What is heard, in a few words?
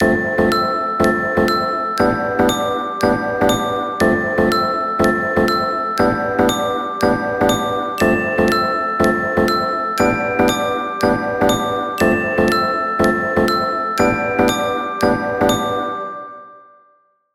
samsung s9 rose ringtone